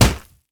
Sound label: punch grit wet impact